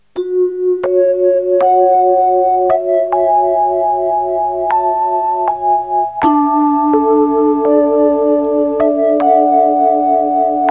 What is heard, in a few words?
vibes